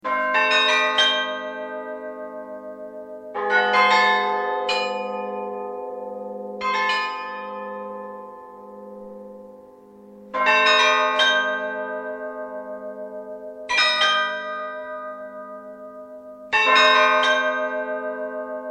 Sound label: cycle for carillon Solitery Bells